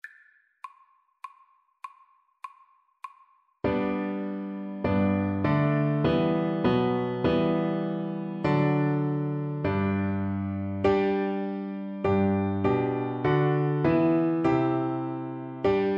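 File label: VLC karaoke, Cello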